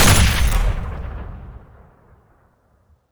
heavy cannon bolt, weapons

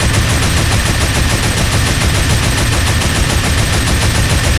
giant heavy gunfire; brassbeast

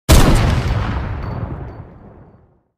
heavy sniper sound

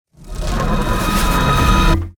voidling laser charge

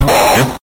bomb; mission folder that appears when you have taken too long in a ghost house

